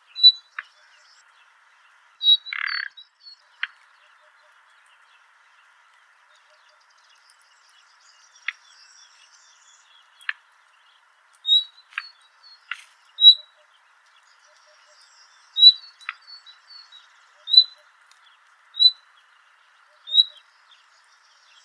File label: call, Nightingale, The tick call sounds like a bill snapping (see spectrograms). Background: Hoopoe and Great Tit.; luscinia megarhynchos; I was very close to the hedgerow